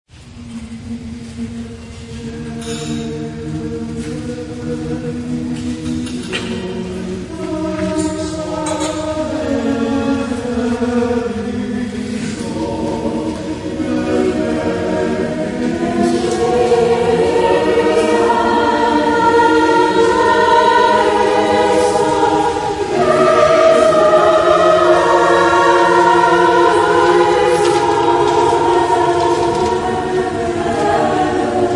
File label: Kyrie Eleison choir